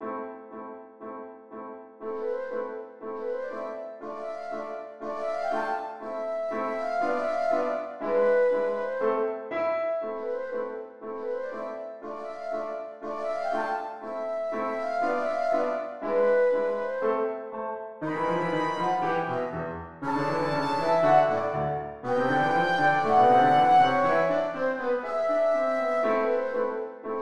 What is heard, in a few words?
catch the villian flute